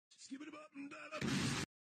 Exploding Toilet

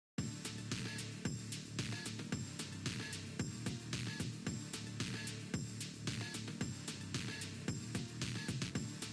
Phonk

